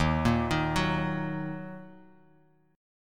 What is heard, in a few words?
Eb Minor Eb; Ebm arpeggiated; JGuitar